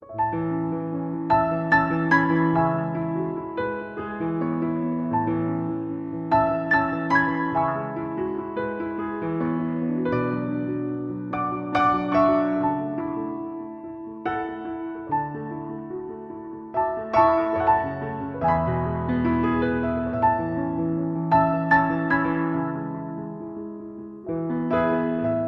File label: Music Box